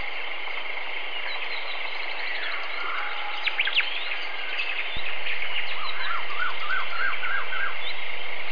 jungle

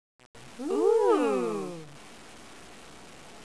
oooo

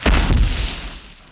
Hit Effect Concrete; 1944 Battle Of The Bulge

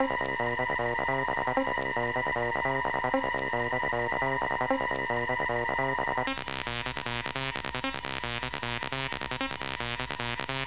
acid rap

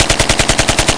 aks74u; The Sum of All Fears

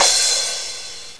Cymbal Crash; Dabbler

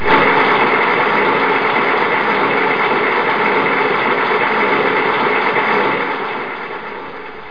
win game; Skat 3.0 Demo

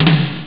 brass; OctaMED SoundStudio V1

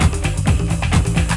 Drum Loop

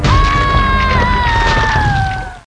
female death

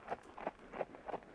HORSE; Alexander

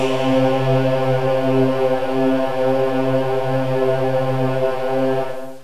sing, singers; Terra Sound Library; construction set

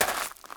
step; wob2 demo

